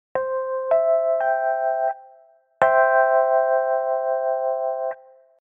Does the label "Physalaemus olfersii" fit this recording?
No